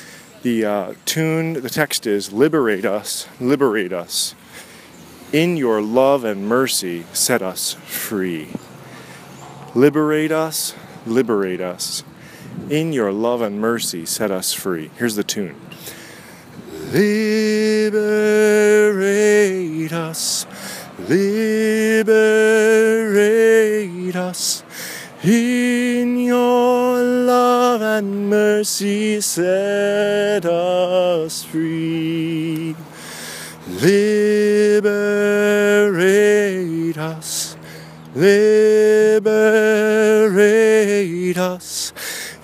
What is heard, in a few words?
Melody; Liberate Us